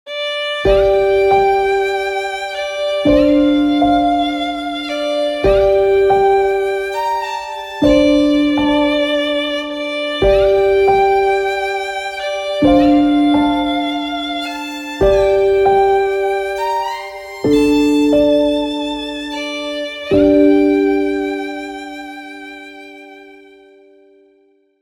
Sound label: so so sad sad meme mus